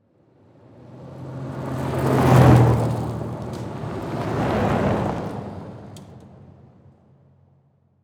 Transportation Car Drive By Dirt Road Multiple

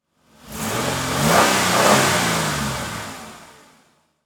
Transportation Jeep Commander Rev Multiple

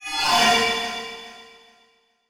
Multimedia Internet CD-Rom Flash Element

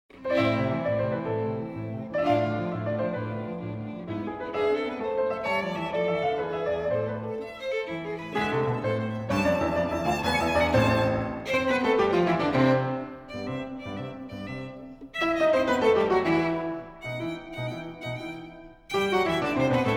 Sonata in A minor, Violin Sonatas; Norbert Brainin; The Complete Violin Sonatas Vol. 1

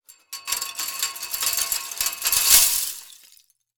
Crashes Plastic Debris Falling On Metal; AdobeCC

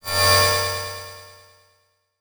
Title Transition Delay Short

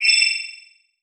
Multimedia Internet CD-Rom Flash High Pitch

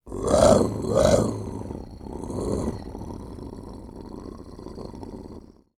Animal Mammal Carnivore Cat Lynx Snarl; animals; AdobeCC

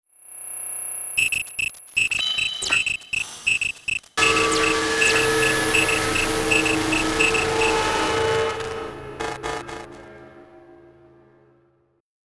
Electrical Beeps Distortion Delay; Module Production Element Title Imaging

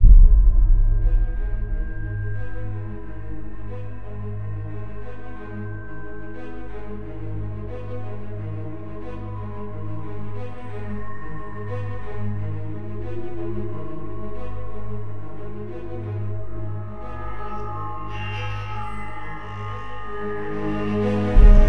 Slow 90BPM, Cinematic Trailer Music; BlueFX

